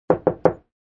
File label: blackberry toc